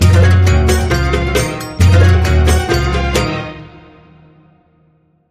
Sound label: Good Oud, Hit